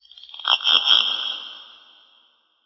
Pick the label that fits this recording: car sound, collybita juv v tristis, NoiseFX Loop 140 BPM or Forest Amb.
Forest Amb